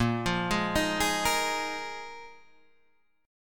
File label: A sharp 7th Suspended 4th A sharp; arpeggiated; chordshape; 6,8,6,8,9,6